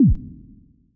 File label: warning; Stunt GP